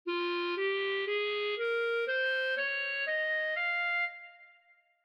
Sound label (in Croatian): g mol, clarinet